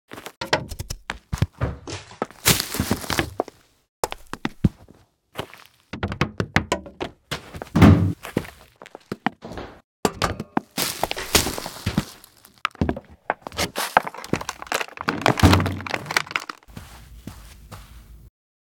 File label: Footsteps